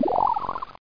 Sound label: move cannon; delirium